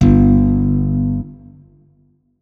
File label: notes, guitar; Hideout Furniture